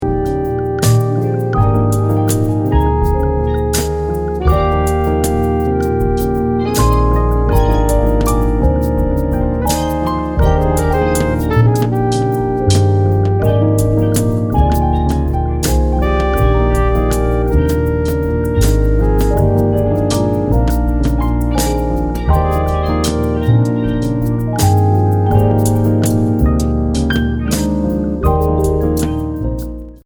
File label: bounce